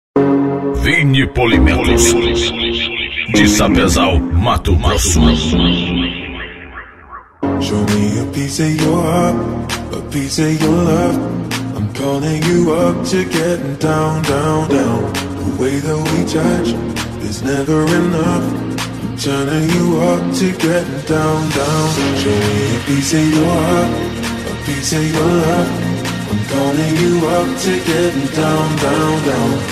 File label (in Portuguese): funk e rap